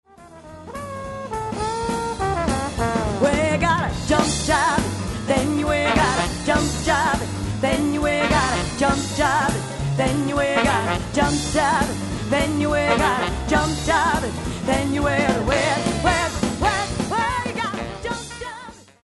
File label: Jump Jive